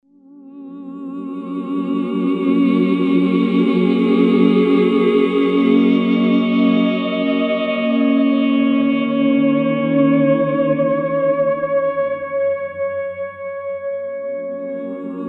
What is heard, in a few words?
Choral